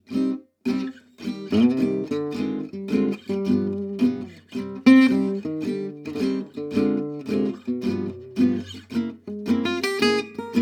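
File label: mélodium mélodynamic 55a close miced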